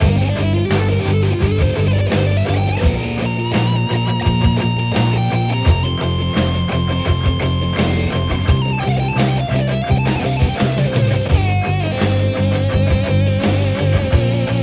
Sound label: Metal Soloist C 85 bpm